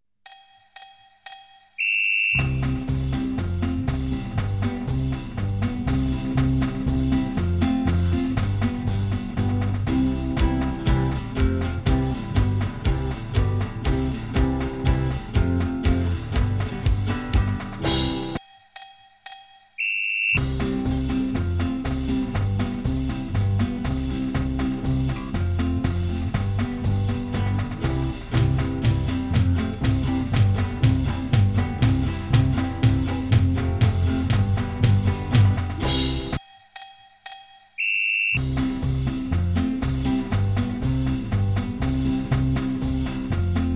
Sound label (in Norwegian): instrumental; Eg gjekk meg over sjø og land